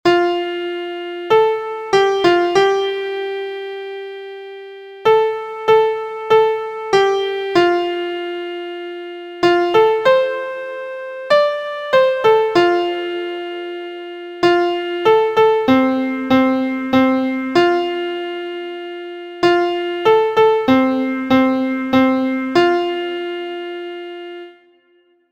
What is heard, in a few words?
SWEEP